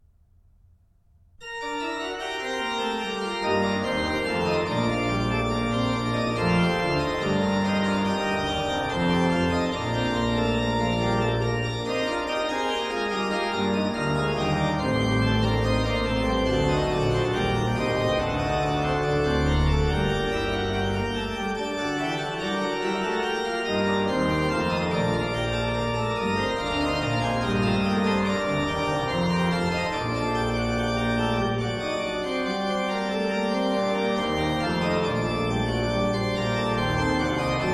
Heut triumphieret Gottes Sohn, BACH ORGAN, Chorale preludes; Orgelbüchlein